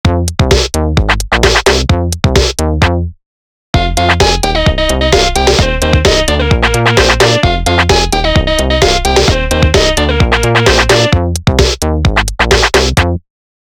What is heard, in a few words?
cheap synth